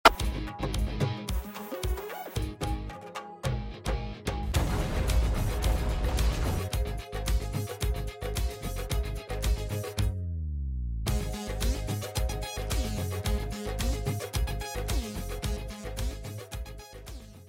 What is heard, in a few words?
gang music, hip hop